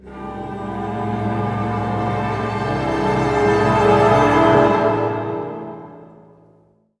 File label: Stinger; Blaumeaux Faminerider